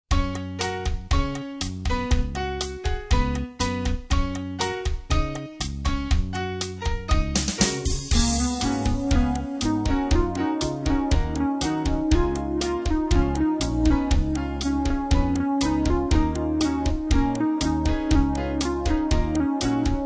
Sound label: instrumental